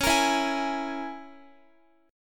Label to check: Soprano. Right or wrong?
Wrong